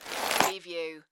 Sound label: Box Nails Set Down; sound-effects-bible